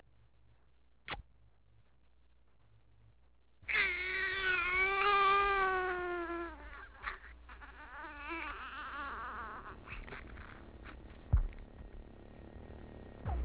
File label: kiss